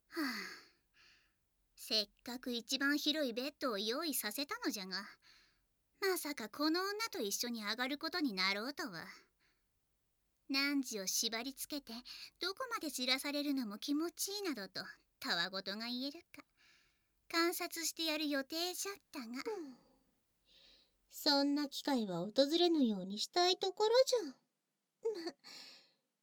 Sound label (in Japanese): 両耳舐め乳首弄り,ASMR; 和洋ロリババアに甘やか弄られささやき